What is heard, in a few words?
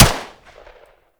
Pistol Shot A; Warframe; Tenno; Tenno Harlequin Pistol